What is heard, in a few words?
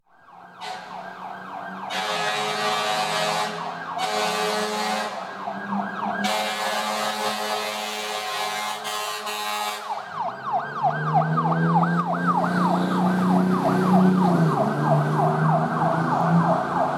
Vehicle Fire Engine Drive By With Sirens And Exterior Noise